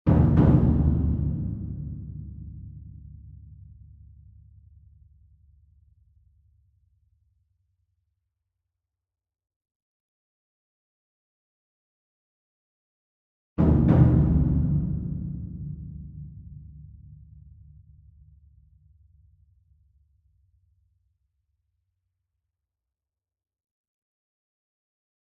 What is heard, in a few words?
ENSEMBLE DRUM Bass Drum Concert Large Double Far; boom library; Cinematic Strikes Construction Kit